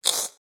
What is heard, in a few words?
alien, creature